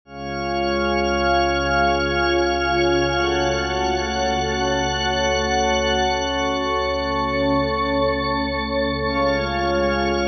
pad; fire by music sound software

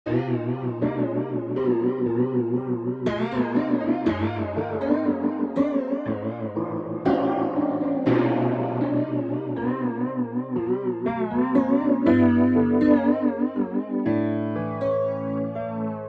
piano wet; foxfire; chiral audio

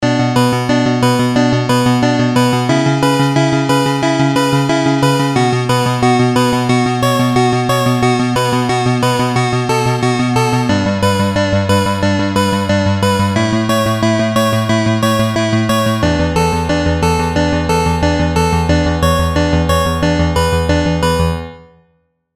chippy lead

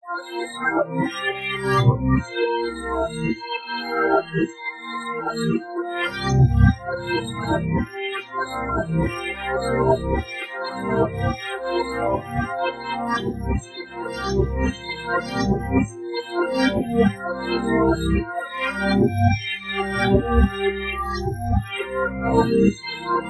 Cinematic Tension Builder; kaleidoscope

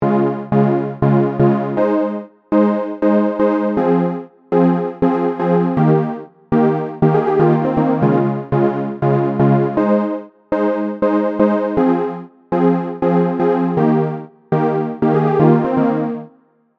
dnb reese

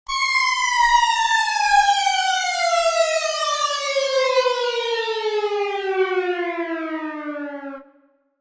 vital presets; chiqui audio; vital fx presets

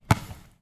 dirty ball bounce basketball; hoops drums; drum kit; nova sound

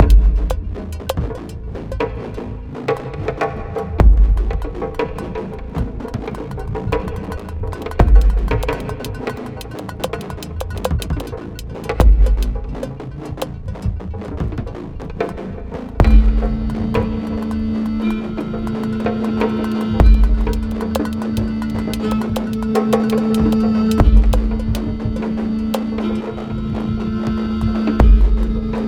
drumcloud; drumcloud by fuimadane

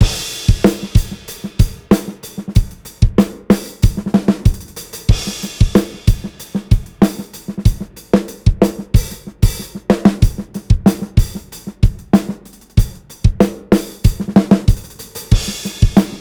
live drums; df xcite; drumforge

